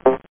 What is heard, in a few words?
medical; star trek